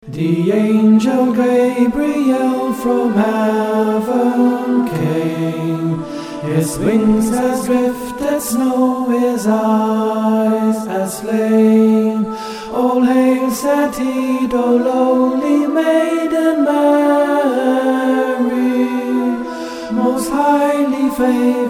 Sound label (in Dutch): gabriel sopranen, kerstnummers ingezongen